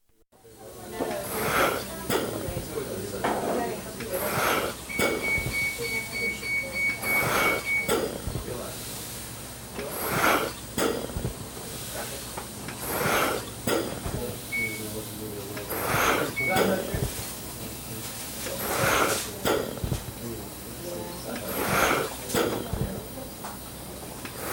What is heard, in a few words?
Ventilator, busy period in intensive care unit (Recorded at patient's end); Hospital; Hospital ICU; BBC